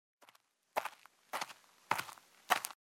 Footsteps; Age of Empires III; cinematics

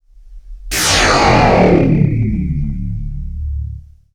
Zap Synth, Sound Design - Sci-Fi; Sony Pictures Sound Effects Series Volumes 1-10; Sony Creative Software

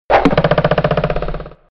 Bulls eye message; Sony Ericsson P1i

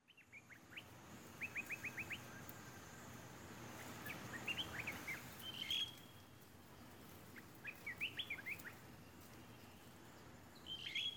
Jungle; Age of Empires III; cinematics

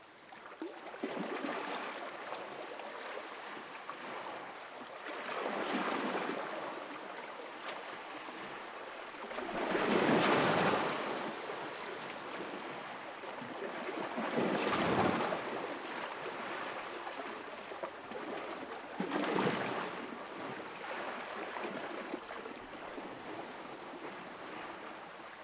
water; RYL 1 v5.57

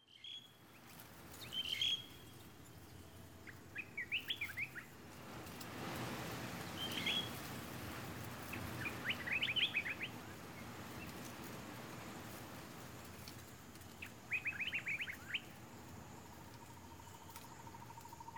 Birds; Age of Empires III; cinematics

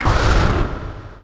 shout; RYL 2

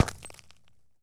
Bullet Into Windshield; Sony Pictures Sound Effects Series; Ricochets - Hits - Whiz Bys

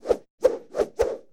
Arm Whoosh Multiple, Hand To Hand Combat; Sony Pictures Sound Effects Series

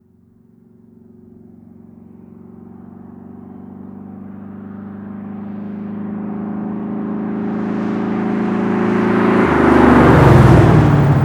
Muscle Car In Turn Idle Off; Sony Pictures Sound Effects Series

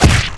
fast hit; RYL

